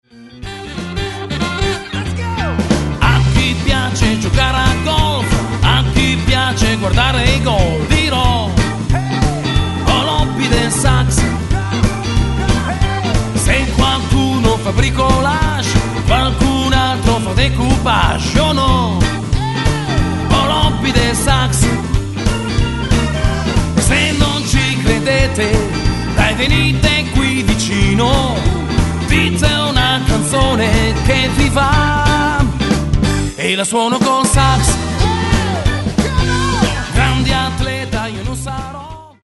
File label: sax